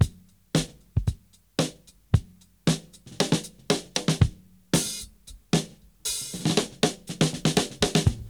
Breakbeats

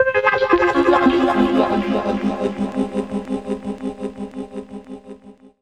Rhodes; Blu Mar Ten; Jungle Jungle - 1989 to 1999 Samplepack

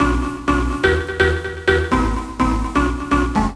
Glock, 9A; Jungle; Riffs, Arps & Hits; Blu Mar Ten - Jungle Jungle - 1989 to 1999 Samplepack

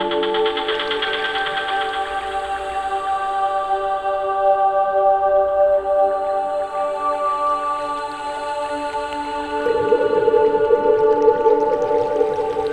Chord Vox Pads; Jungle; Blu Mar Ten - Jungle Jungle - 1989 to 1999 Samplepack

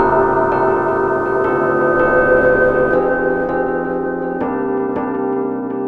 Deep Keys; Jungle; Blu Mar Ten - Jungle Jungle - 1989 to 1999 Samplepack

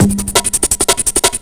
Let There Stutter Break, Jungle; Breaks; Blu Mar Ten - Jungle Jungle - 1989 to 1999 Samplepack

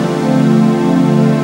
Pad; Blu Mar Ten; Jungle Jungle - 1989 to 1999 Samplepack

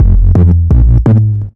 Hearing Bass - 4A; Jungle; Blu Mar Ten; Jungle - 1989 to 1999

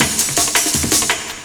Little Roller Amen, Jungle; Breaks; Blu Mar Ten - Jungle Jungle - 1989 to 1999 Samplepack

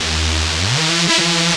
Drumz Reese, Bass; Jungle; Blu Mar Ten; Jungle - 1989 to 1999

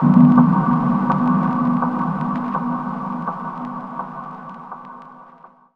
Fluid Clang Hit; Jungle; Blu Mar Ten - Jungle Jungle - 1989 to 1999 Samplepack

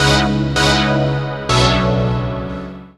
Essential Synth - 12A, Jungle Riffs, Arps & Hits; Blu Mar Ten - Jungle Jungle - 1989 to 1999 Samplepack

